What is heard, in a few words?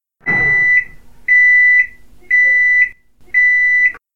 mikrovolnovka